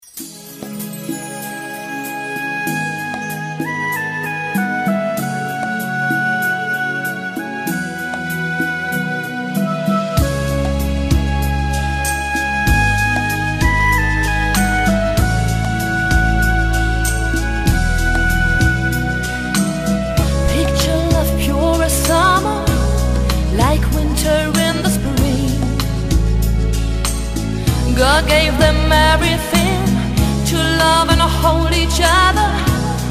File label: Ballroom Rumba